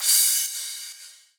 Crash; Crash - Ride; Equipped Music; Loungin House

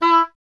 E. Horn

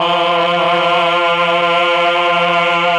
CHOR, CHOR+OBOE; ILIO; Propeller Island Legendary M400

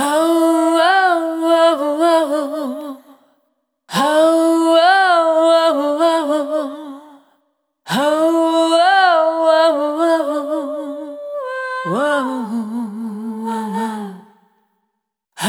Multi-Voice Loops, Vocal Samples; Deiopea; Sonic Foundry (Sony Creative Software) - Pocket Diva World Class Vocal Samples

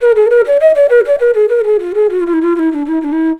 FLUTE D, Indian Traditions; USB Soundscan vol.45; AKAI

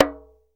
SABARR, AFRICAN PERC; Greytsounds VOL-1

